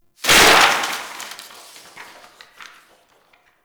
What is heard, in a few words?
Desktop Book Destroy; ambience; Warframe; Entrati